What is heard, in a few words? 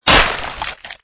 skeleton bone break